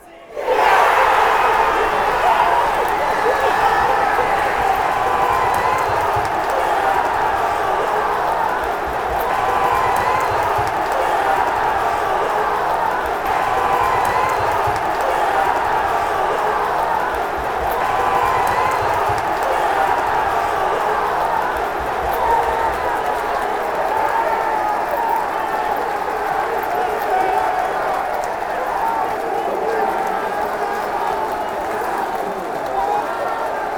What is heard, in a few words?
huddersfield score fanchants